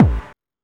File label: Techno E, Drum Machines, EMU XL-7